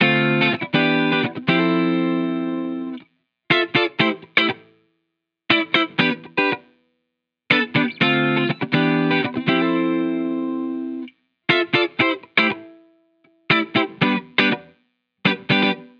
Guitar Bpm120 Key A minor, 70s Guitars; Fox Samples - Back to the 70s Guitars

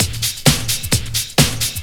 drum 130 straight; Techno Trance Essentials

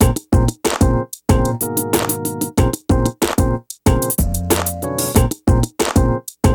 BEAT, 93-96bpm; PlatinumPhattCD1; E-MU Producer Series Vol. 8 – Platinum Phatt; Beats

